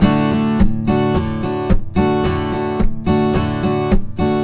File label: Blues Guitar Samples, RIFF; ILIO; Fretworks